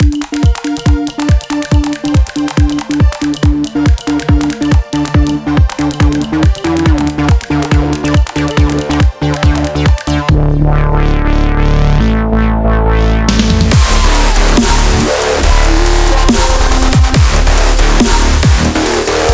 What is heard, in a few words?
bass station; novation bass station; zardonic; gforce software